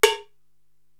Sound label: Cowbell, Korg TR-Rack